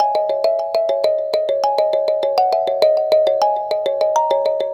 Mbira Hi; Melodic; Ambient-Melodics-II

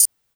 Elektron Monomachine, Drum Machines

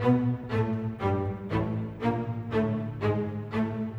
Strings; Orchestral; Sonic Foundry (Sony Creative Software); Orchestral VOL-2; Modern

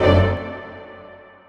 string stab

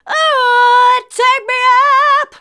Female vocals and robo-vox

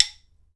KALAAW STI, HAWAII PERC; AKAI S6000 CD-ROM; WorldSounds

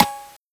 808 Co; Roland MV8800; Dirt&GrimeKit

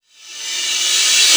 NOISE, Production Effects

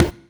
Conga; Equipped Music; Loungin House

